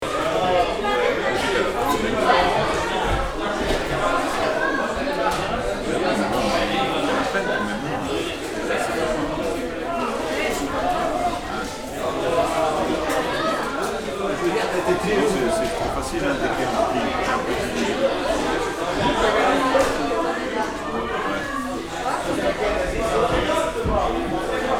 Childrens interior playground lively walla playing loop